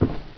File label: land; the-hat-chooses-the-wizard